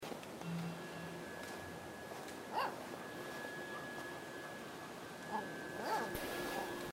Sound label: snow forest sound; Life is Strange 2; gamesoundopedia